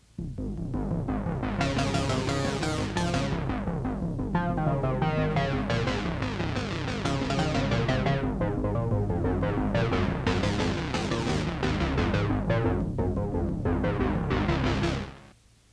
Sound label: P600 fast